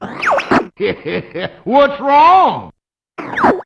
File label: taunt; zerstorer